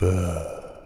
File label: VOCAL PAH; ILIO; Synclavier Strings